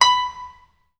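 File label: HARPSICHORD STOP B5; Best Service Advanced Media Trax Modern Composer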